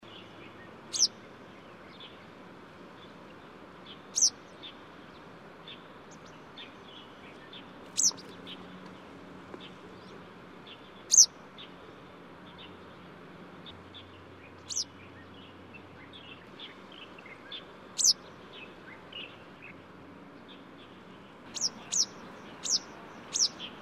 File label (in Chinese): 西方黃鶺鴒; 鶺鴒科/冬候鳥